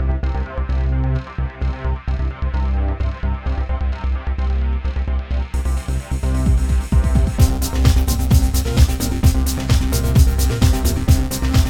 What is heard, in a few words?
battle cave